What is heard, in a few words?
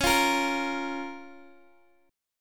C sharp 6th C sharp down Guitar Open D